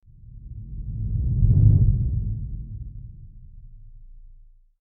Swoosh

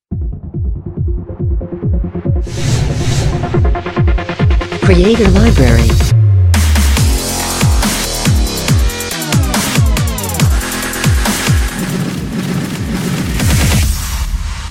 Massive Dubstep Audio Logo; Logo-Idents

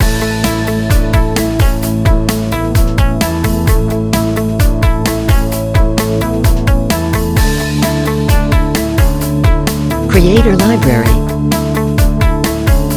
Inspiring Motivation, corporate music

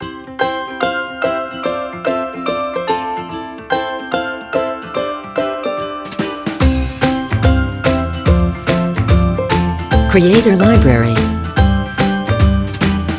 Happy, Pop

